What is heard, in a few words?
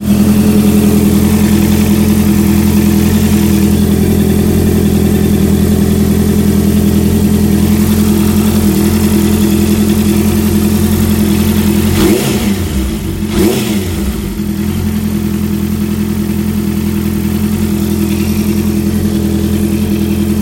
strange engine noise, cbr 650f